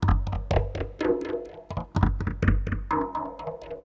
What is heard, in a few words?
PERC LOOP ETHNO 125; eJay